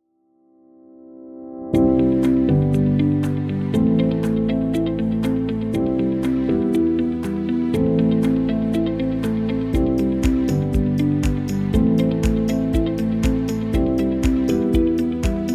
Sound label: Light Corporate; audiojungle